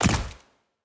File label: movement run; smite; vamana